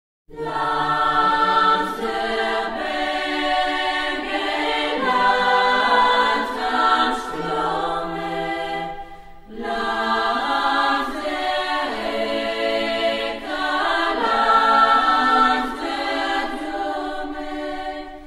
Austrian national anthem Osterreichische Nationalhymne First Part